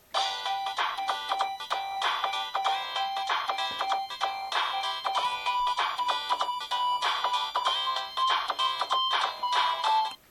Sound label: samsung sghe700 ringtone